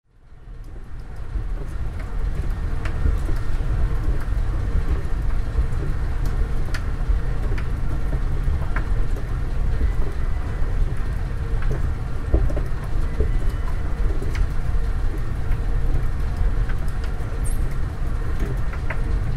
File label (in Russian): zvuk rabotajuschego eskalatora